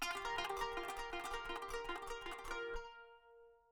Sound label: kanun